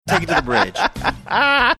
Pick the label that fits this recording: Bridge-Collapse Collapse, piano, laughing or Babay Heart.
laughing